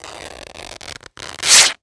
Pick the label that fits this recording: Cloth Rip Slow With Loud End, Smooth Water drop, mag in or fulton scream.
Cloth Rip Slow With Loud End